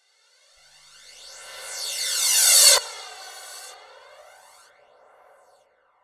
Reverse Crash

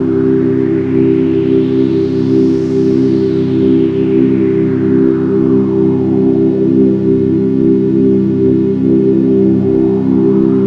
Bleak Pad

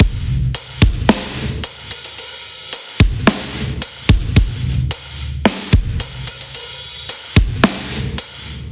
Tenor B, electronica

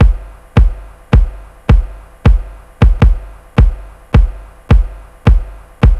Verb Soft, 80 bpm

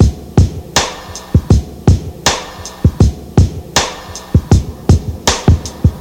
Rim Shot; Ударные и барабаны (Downbeat): Rim Shot A; 80 BPM; Downtempo; Drums; даунбит

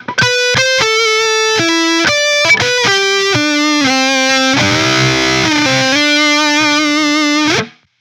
Guit Solo B6, guitar

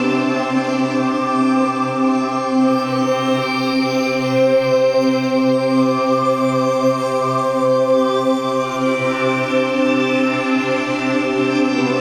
Far East; Фоновый звук (Эмбиент); Pads; фоновая музыка; Ambient; 80BPM; ambient vol. 2

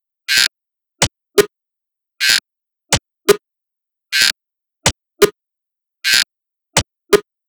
Zippel SFX B, dance

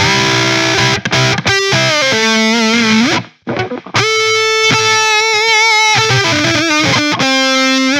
Guit Solo, гитара

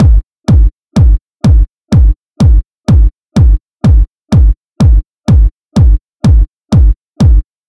Zippel Beat J, dance

